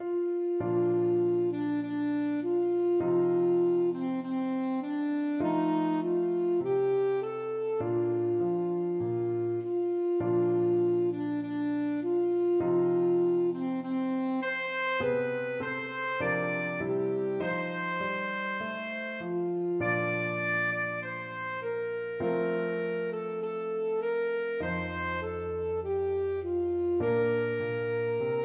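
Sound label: america the beautiful, alto saxophone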